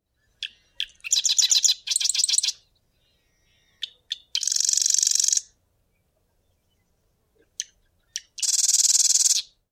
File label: Marsh Wren